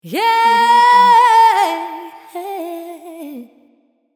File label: female voice sound eee